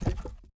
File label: ui drop wood; Rust